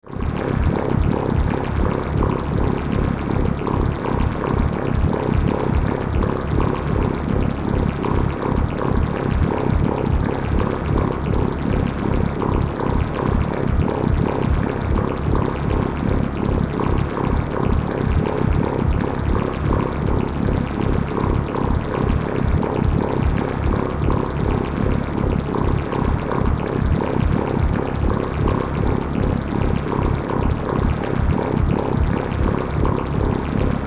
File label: Radiation Accelerator, Machine, Gurgle, Pulse, Science Fiction; 5.1